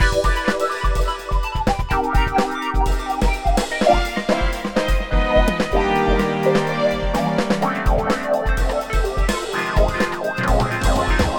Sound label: SPACE FUNK TV